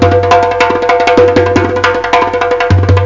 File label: arab rhythm loop5; Octamed 6; walkabout; arabic